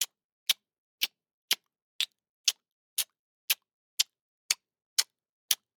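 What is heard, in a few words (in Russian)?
zvuk chavkaniya, sound of chomping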